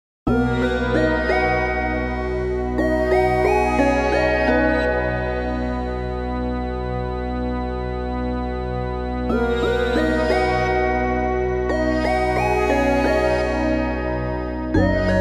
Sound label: moonlight chimes